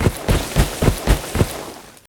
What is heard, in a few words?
Footsteps; caverners game design